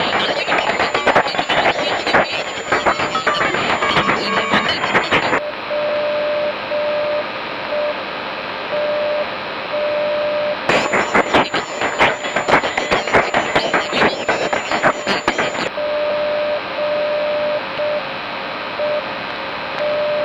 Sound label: jjy 60